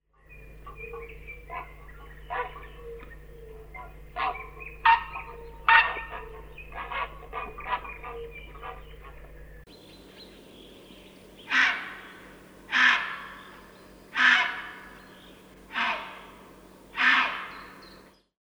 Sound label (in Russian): seraya caplya golos